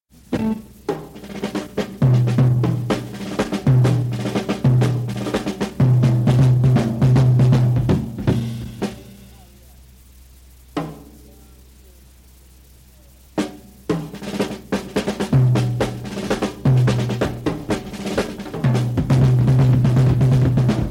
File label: Instrumental Jam (Flute Jam mixed out)